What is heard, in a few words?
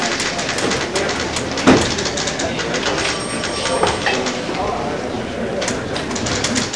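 background music; Virtual Graceland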